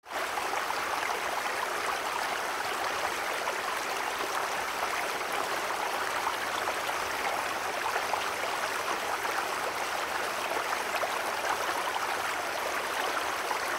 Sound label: Water Element Inner Spontaneous Sound Example